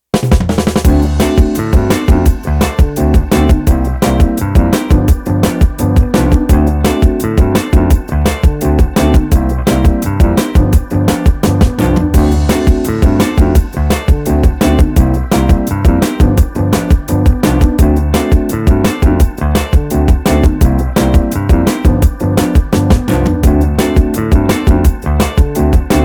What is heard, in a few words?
cheerful entertainment; by burak sound bank; cheerful vol 5